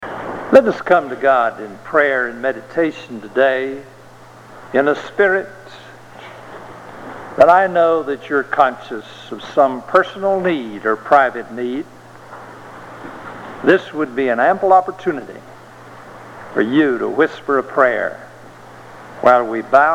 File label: Organ Chimes Meditation